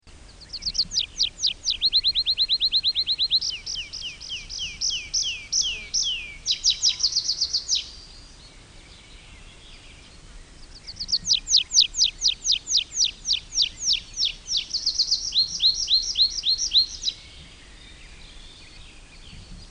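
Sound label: Tree pipit; Erdei pityer; Madarak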